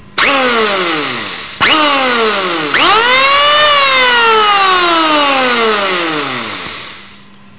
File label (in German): Bohrschr